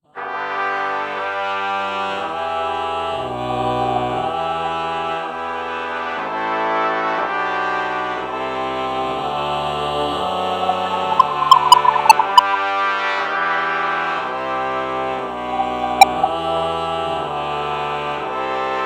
ah trumpet morph

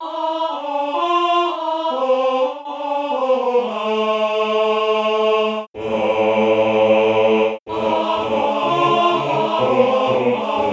ahh choir

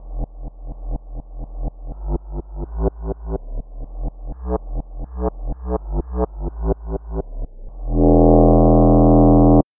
clarinet bass

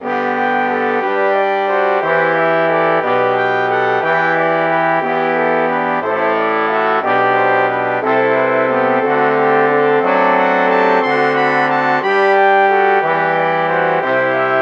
trombone

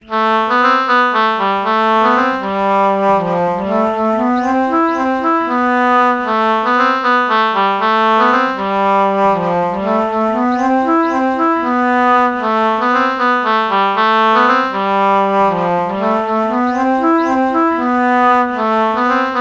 alto flute oboe melody morph low

